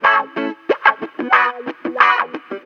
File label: STRING; USB Soundscan vol.41; AKAI; Roots Funk